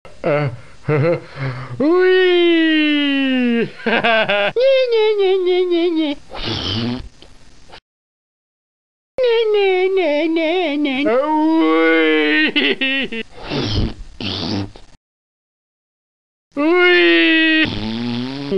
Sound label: wheee